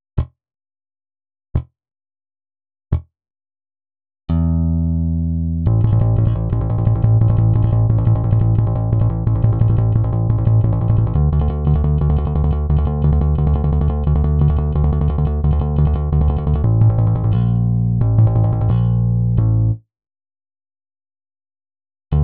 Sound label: Bass